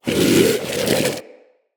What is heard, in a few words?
Vocal Breathe, Hunting Critters; Characters; Vulpaphyla